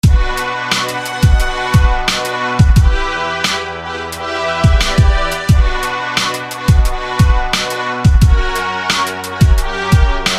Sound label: Brass of Life